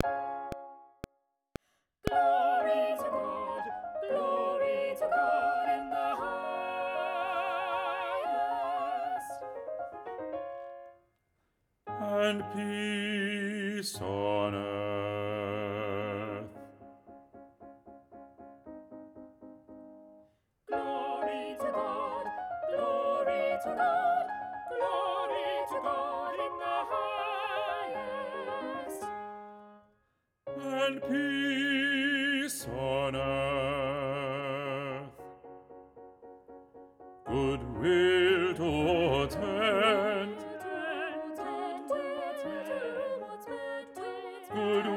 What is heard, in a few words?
Glory To God BASS